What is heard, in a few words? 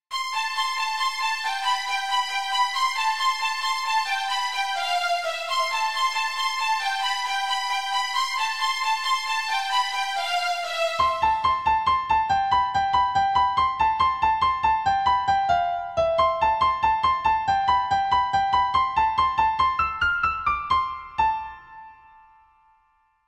violin bgm mobile piano; easy piano tutorial; perfect piano